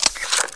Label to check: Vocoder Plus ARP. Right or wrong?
Wrong